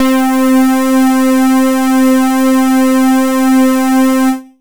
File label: Synths Note C